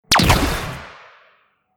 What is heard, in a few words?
fire, weapons; light rifle